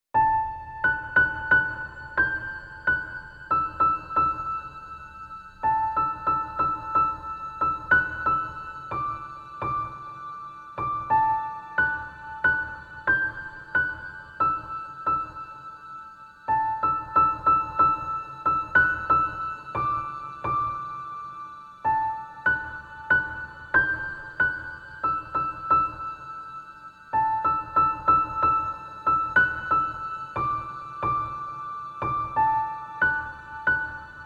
Piano, Baby Lullaby Piano Sleep Music; Hush, Little Baby

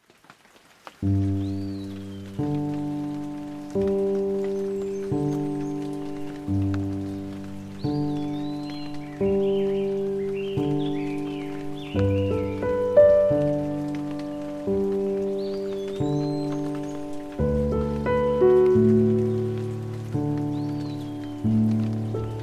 Nature's Piano (With Rain & Nature Sounds)

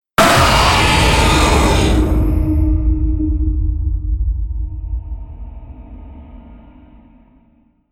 Demon Scream; Ninja Tracks

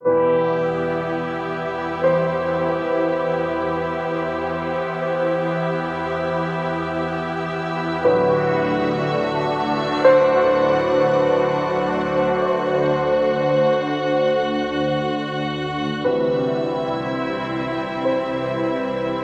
Abandonment Ambient

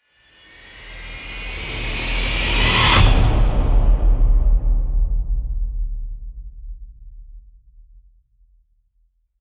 Short Distort; Gothic Storm Music

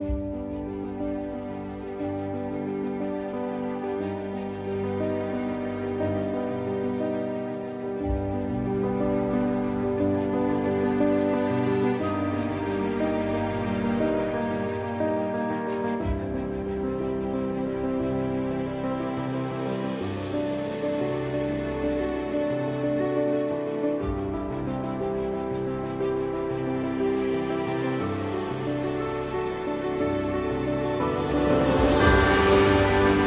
Incredible Ambient

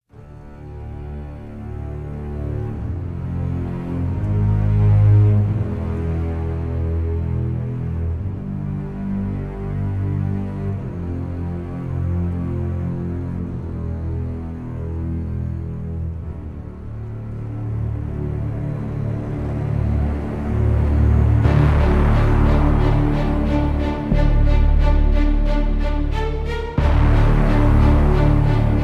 No Perc

